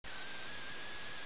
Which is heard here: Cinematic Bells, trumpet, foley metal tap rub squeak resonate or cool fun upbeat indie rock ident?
trumpet